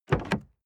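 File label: sports car exterior door open medium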